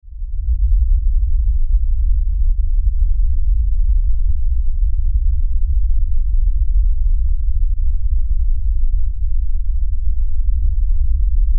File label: LFE Rumble, Lfe Rumble Low Bass